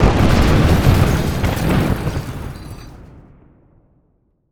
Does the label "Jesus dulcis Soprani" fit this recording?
No